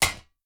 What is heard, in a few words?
arrow metal, attack impact